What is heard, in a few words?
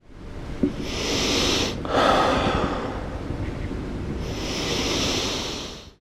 ritual release whale